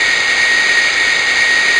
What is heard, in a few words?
turbo, Vehicles